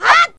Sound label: stick miss, weapons; zelda